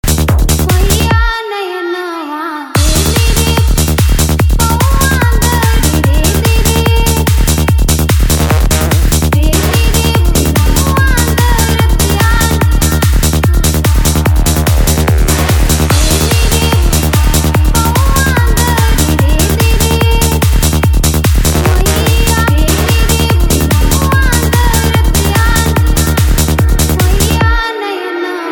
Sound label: trance